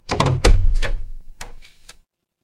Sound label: door open